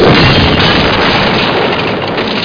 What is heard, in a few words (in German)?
aua; kampf